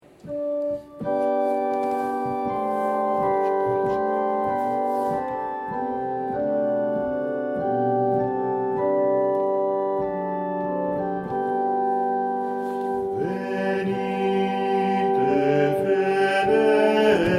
Cont Bassi; 343 Venite fedeli

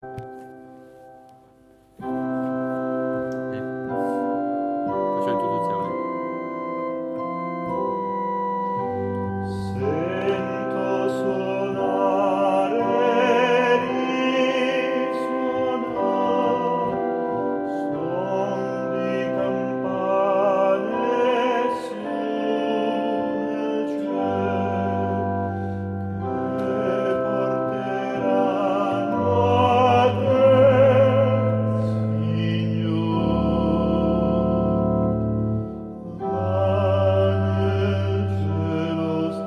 Tutti

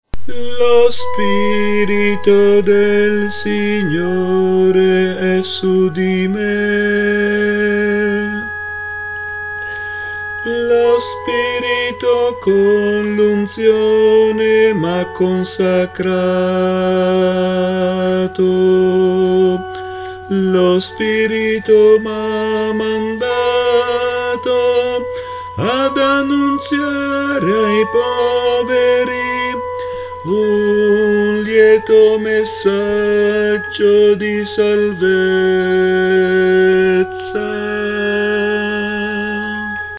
Tenori Canto

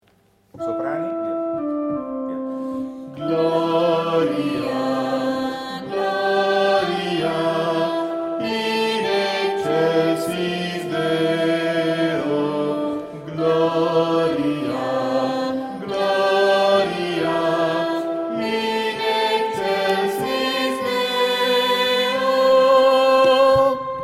Gloria Sop Voc; Visita Arcivescovo

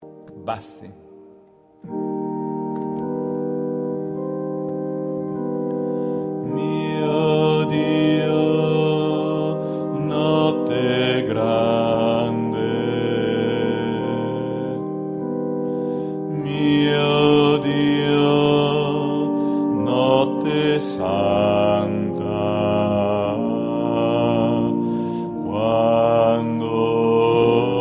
Bassi; Natale; Mio Dio notte grande

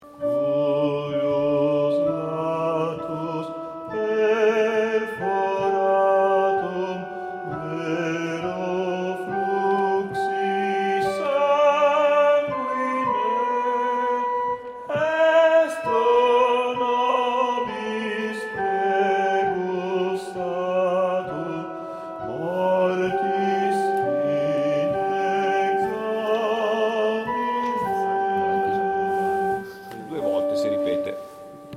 Sop Cant; Ave Verum corpus-E.Edgar

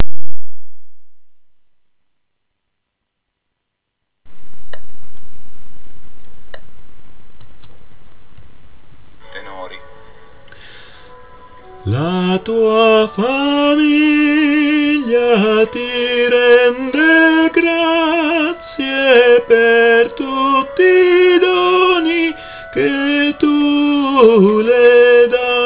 tenori Organo; Family2012

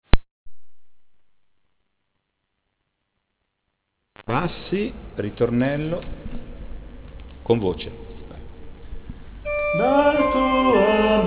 Bassi

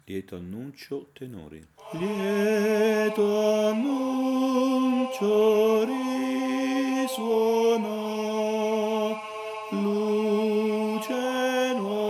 Tenori

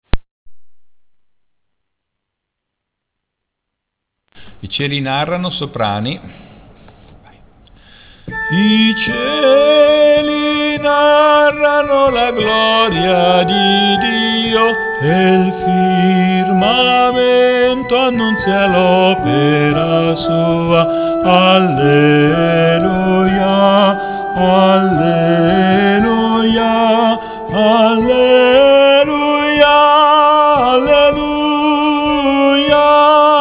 Soprani; 156 I cieli narrano

